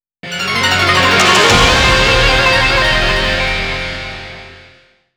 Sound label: Sword Sheathing (game over); Akai Katana Shin arrange album